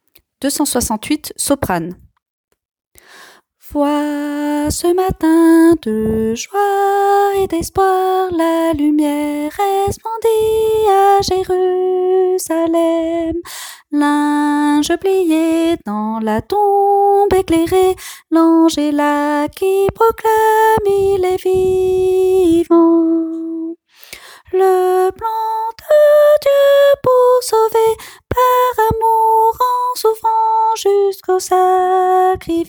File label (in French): soprano